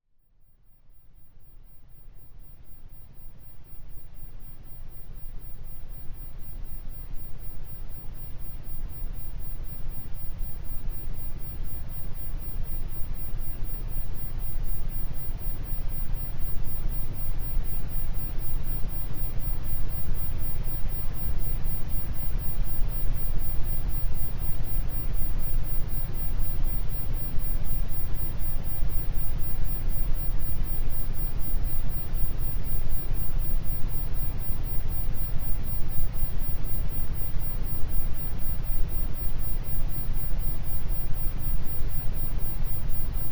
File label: concentration binaural